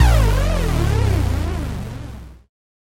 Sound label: Bass Drop; SoundsCrate